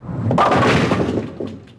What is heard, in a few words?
TENPIN